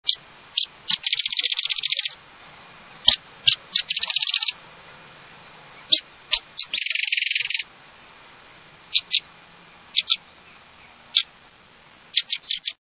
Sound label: Sedge Wren